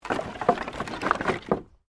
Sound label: Wood Movement Medium; Material components for constructing more complex sounds; Materials